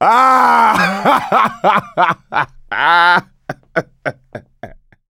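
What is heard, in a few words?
laughter, male; survivor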